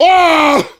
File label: Heavy pain severe